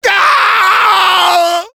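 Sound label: sniper pain critical death, fr; *[Hurlement d'agonie 4]*; Réponses du Sniper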